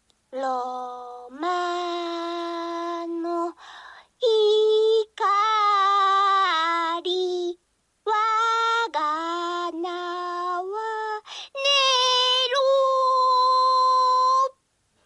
farewell song

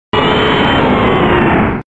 kyogre cries; pokemon

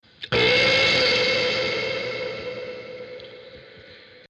burp

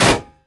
metal impact

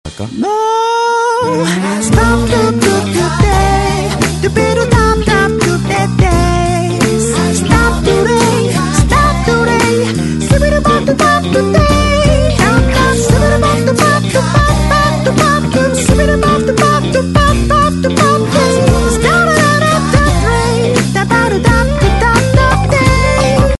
romantic

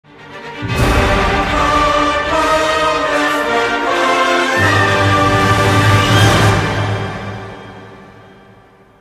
miniboss fanfare; dragons dogma

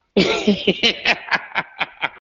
laugh

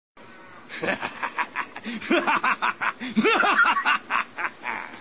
engineer laugh

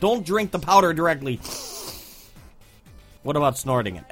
snort

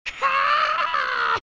carpenter boss; oot

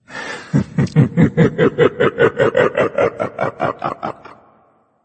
sci-fi laugh

